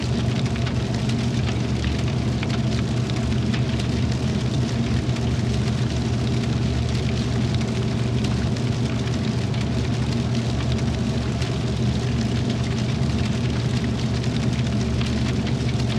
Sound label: steel furnace; factorio 2.0.55